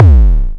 Kick, Drums & Percussion One Shots Drums

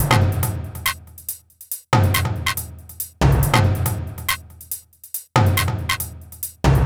Perc mix, acoustic percussion loop; Drums & Percussion; Percussion Loops

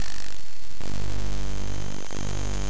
Synth Bass

